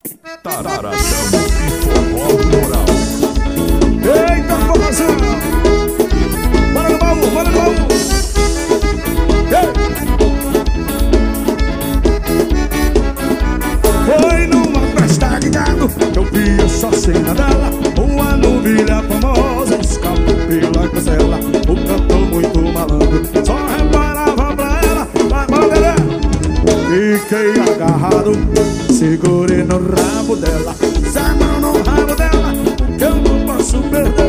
forró ao vivo

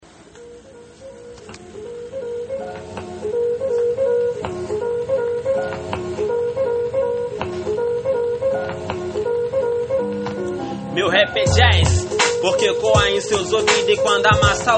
meu rapp e jazz

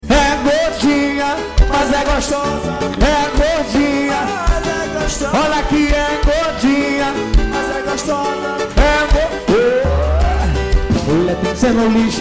banda swing

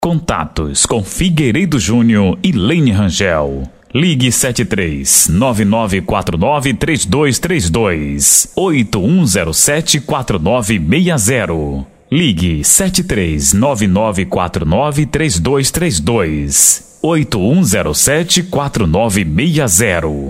arrocha; romantico

